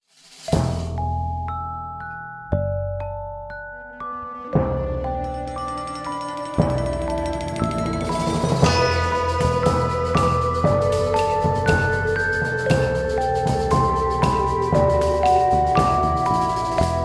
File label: gameplay stinger good; curious expedition 2 macos ps4 switch windows xbox one xbox series xs gamerip 2021